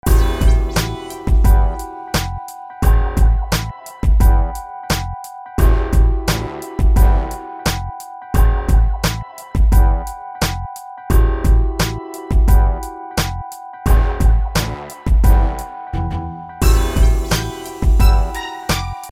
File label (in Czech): melodii beat; k vasemu zpevu